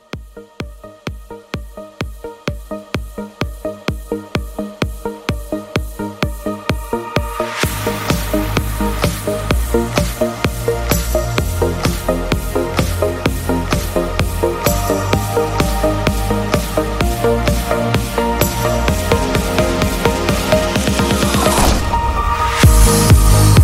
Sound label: i phone x s summer ringtone